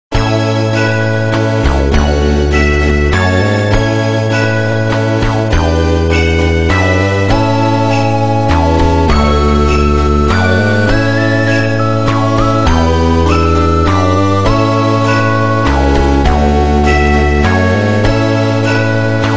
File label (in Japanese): RPG風ファンタジー編 森